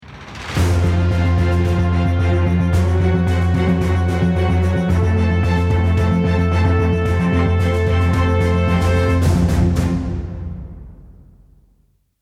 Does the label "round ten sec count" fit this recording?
Yes